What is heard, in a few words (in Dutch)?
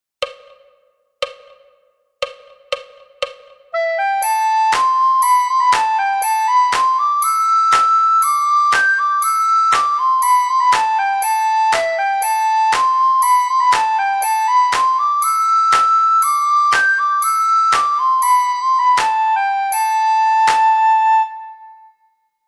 bamboe sopraan snel tempo; Flierefluiter 6